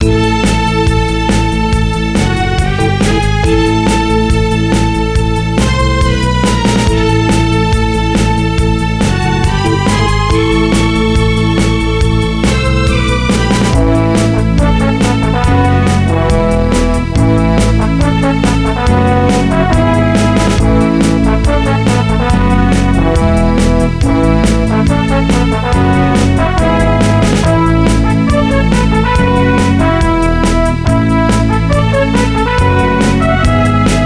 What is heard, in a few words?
end music; triviachallenge